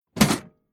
Tool Box Dropped On Ground Hard Impact Metal Rattle; Hand Tool Sound Effects